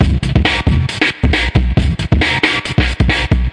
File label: 136 bpm, overloaded big beat, drum loops and breaks